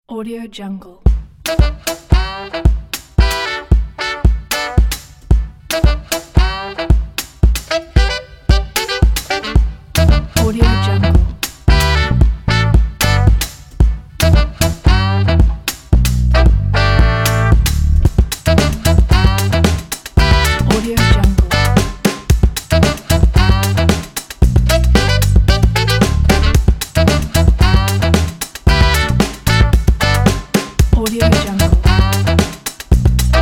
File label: the jazzy boulevard